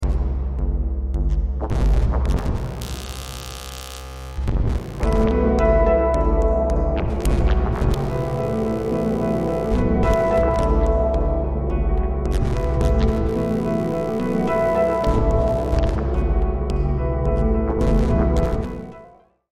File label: Resonator